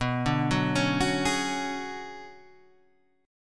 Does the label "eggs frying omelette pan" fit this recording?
No